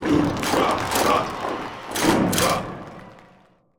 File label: Theatre, Complete; 0AD